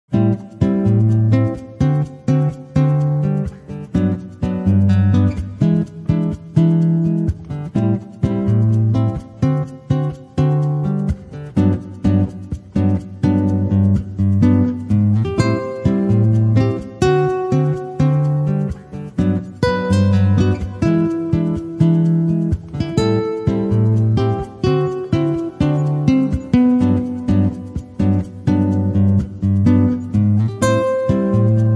Bossa nova by acoustic guitar relaxing jazz nylon guitar